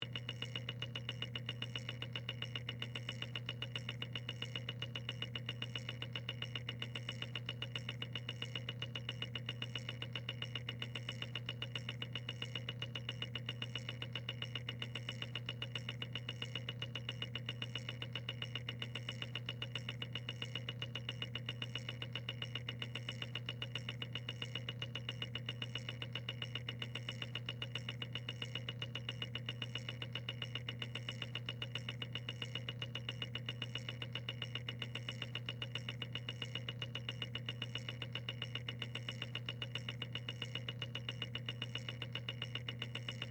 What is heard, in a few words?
Electric Timer, Tick, Loop, Low, Hum, Antique; Technology; Miscellaneous Electronic Sound Effects; Blast Wave